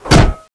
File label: axe hit; oblivion darkangels